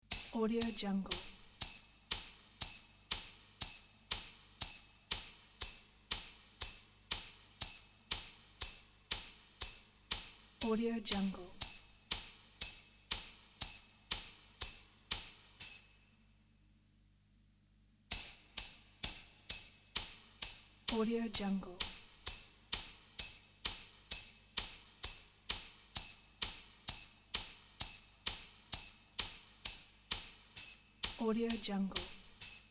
sleigh bells; likers music

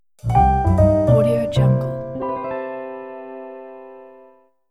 jazz logo; bluejaystudio

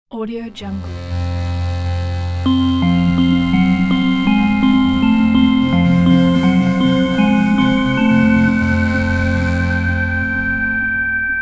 creepy intro transition

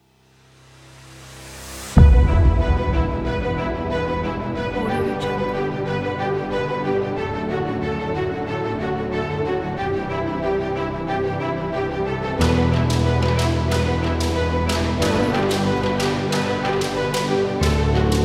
cinematic motivation trailer; csrproductions

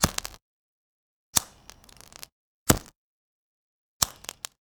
spark gas

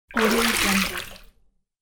small water surfacing

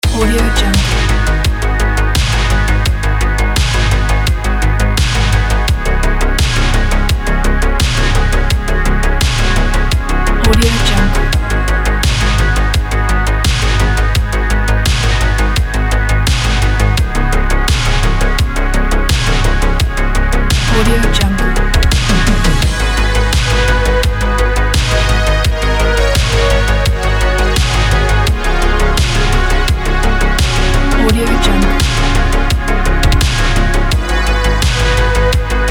eighties night ride

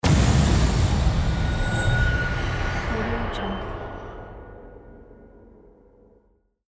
arcane dark magic spell

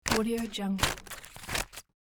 scissors paper cut medium speed

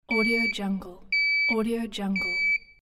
beep sound

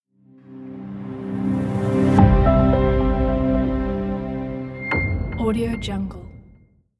elegant logo

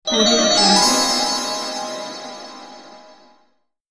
arabian night magic melody

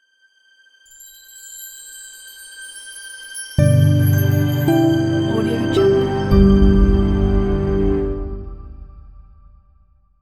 corporate logo

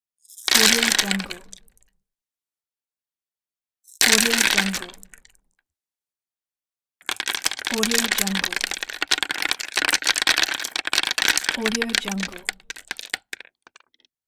pearls drop on wood

